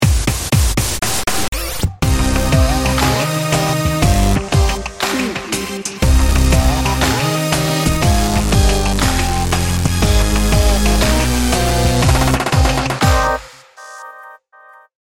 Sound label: dubstep downtempo